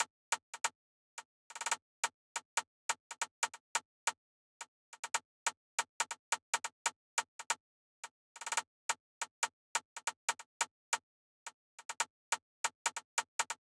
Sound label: Drum Loop 140 BPM Perc; Loop Stems; Cymatics; Mystery Pack Vol 4; Birmingham